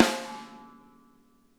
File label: snare, drum kits